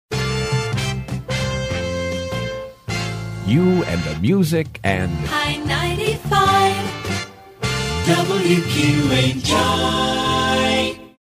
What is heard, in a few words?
Image Jingle; WQHI